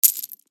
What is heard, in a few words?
other player cash out